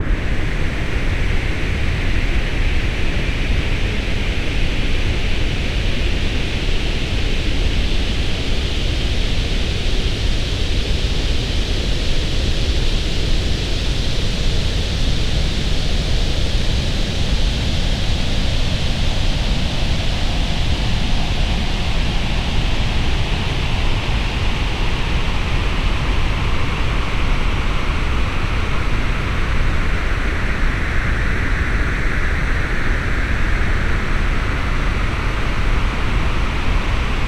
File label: simple noise